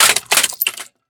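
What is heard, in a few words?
rifle drop glass; STALKER; IWP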